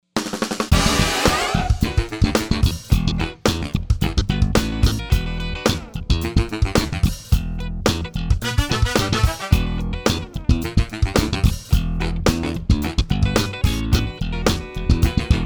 Funk d Up

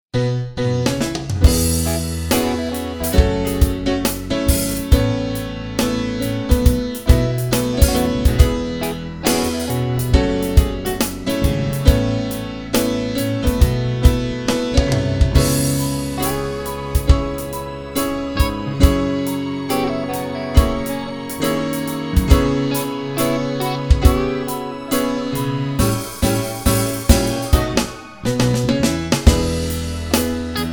Pop Track piano

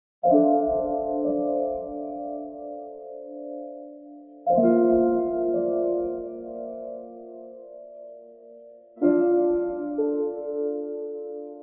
Braunschweig Upright; Valhalla Room; Cubase eq

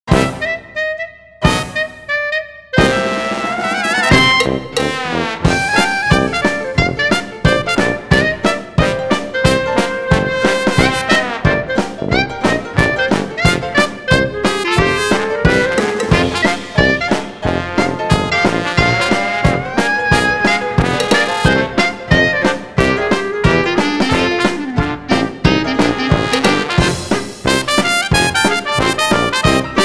Ragtime Flappers

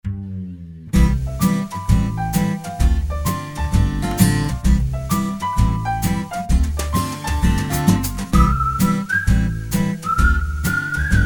Strolling and Whistling

